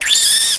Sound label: tunneler worm hurt